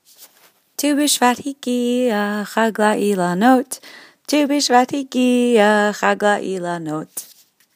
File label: song; tu bshvat